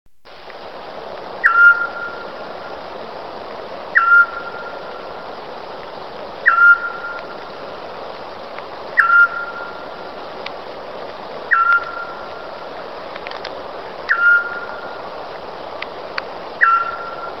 zwergohreule

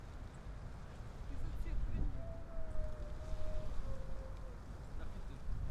maskenammer

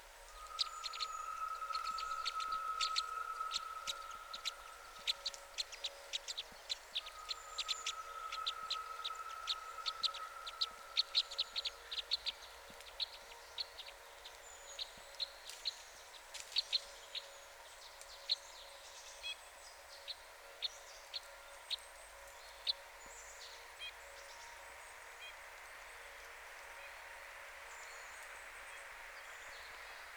bindenkreuzschnabel

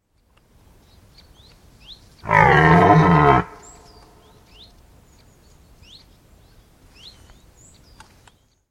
zilpzalp

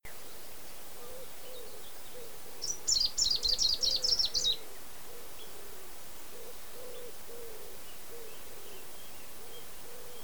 gruenlaubsaenger

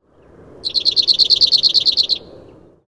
zaunammer

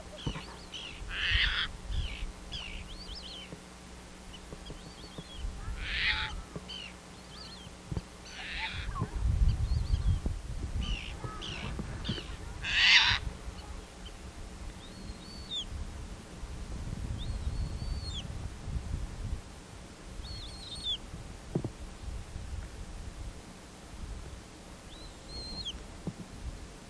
raubseeschwalbe

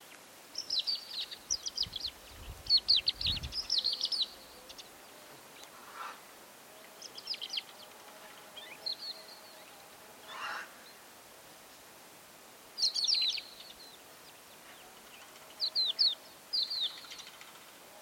haubenlerche

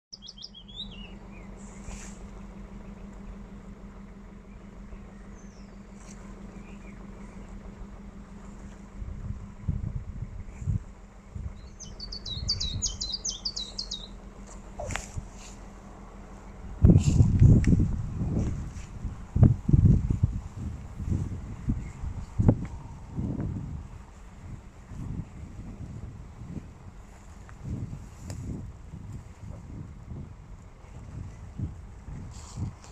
fitis

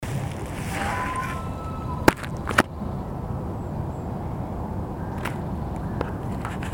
eistaucher

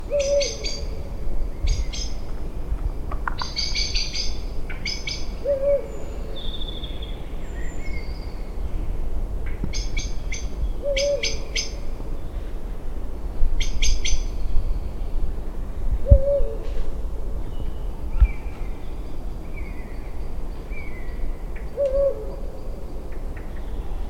uhu

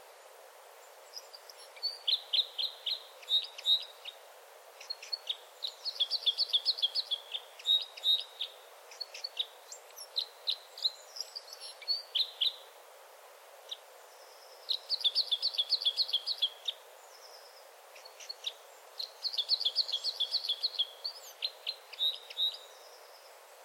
fichtenkreuzschnabel

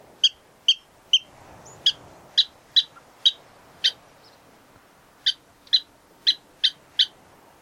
wasserralle

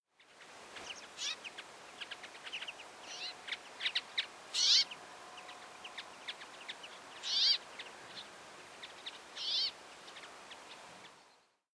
bergfink

